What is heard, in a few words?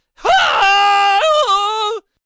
Attack Vocals; Super Peasant